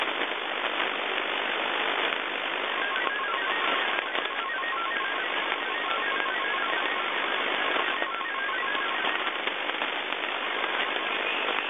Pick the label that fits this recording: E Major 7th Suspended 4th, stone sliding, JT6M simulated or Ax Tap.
JT6M simulated